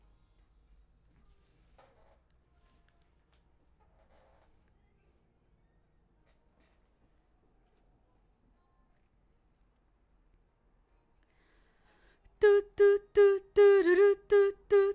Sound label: BG Vox